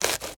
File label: slice bread thick; cooking game actions; esmteam